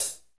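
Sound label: hi-hat; Northstar; Drumscapes Roland; A C Kit 1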